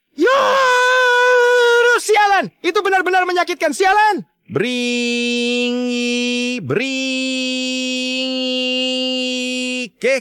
loop; ding dung dung sahur

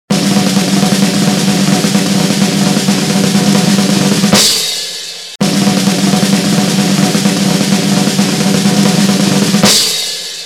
drum Idle; triceradum cursed

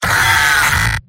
heavy pain severe; vo; tf2; mvm

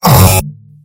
engineer pain sharp; tf2; mvm

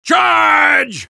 soldier battle cry; vo

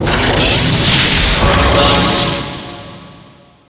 invulnerable; mannpower; Garry's Mod; tf2

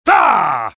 heavy taunt exert; vo; taunts; tf2

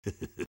laugh short; sniper

